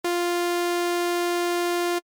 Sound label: saw